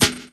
SNARE; E-Lab; X Static Goldmine 2; akai

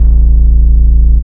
bass

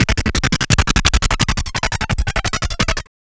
FX SEQ; E-Lab; X Static Goldmine 1

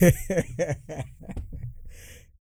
LAUGH, Vocal Jazz Fx

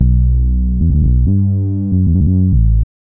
B LOOP; E-Lab; X Static Goldmine 1

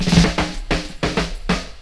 DRMROLL; E-Lab; X Static Goldmine 2; akai

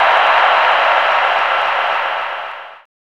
CROWD; E-Lab; X Static Goldmine 1

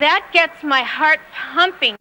VOX

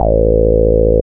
BASS; E-Lab; X Static Goldmine 1

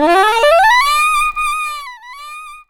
SAX RIF

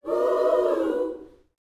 Vocal Gospel PHRASE

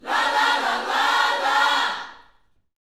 YOUTH CH PHRASE, Vocal Planet Gospel

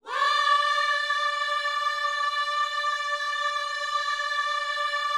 WHOA D 5, Vocal Planet Gospel; Spectrasonics; Vocal Planet Vol.1 Gospel; akai

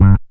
BAS TON; E-Lab; X Static Goldmine 2; akai

